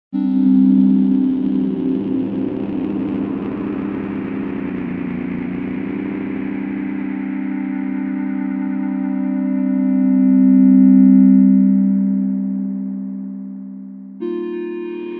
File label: pure and dirty synth